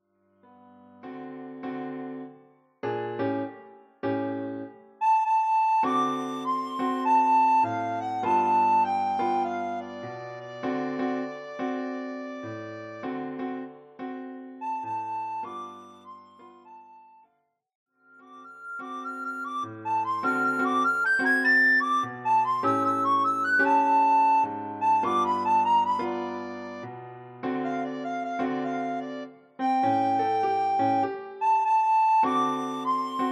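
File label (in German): Sopranblockflöte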